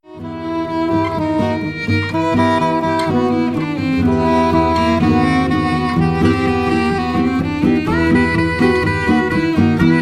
trio

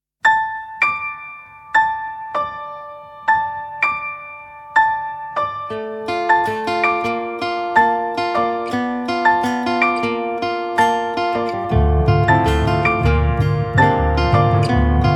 bossa

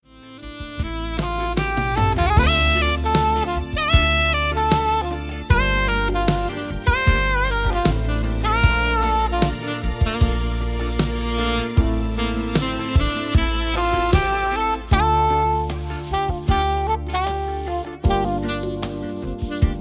pop saxophonist

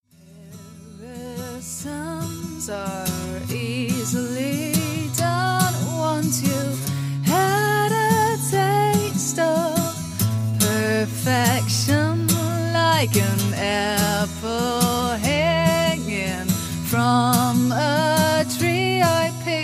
band live tape duo

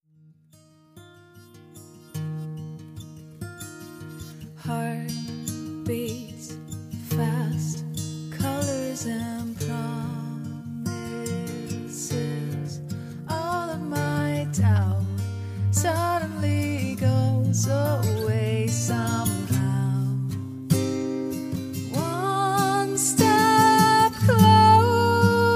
band live

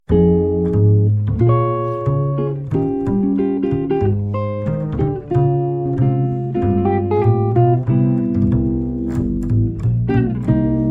medley instrumental duo

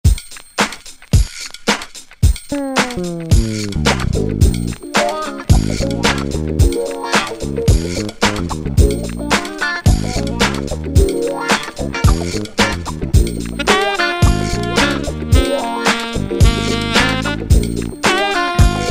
instrumental

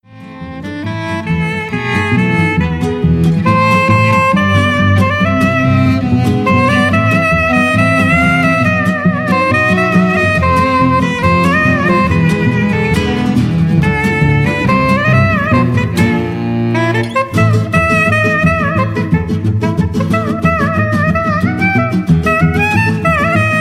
trio

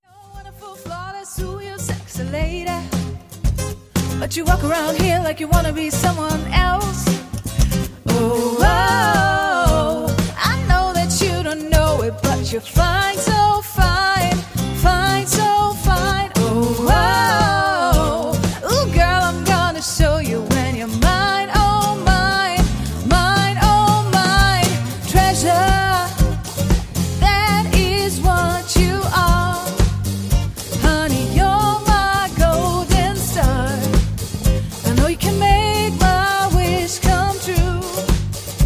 trio live tape

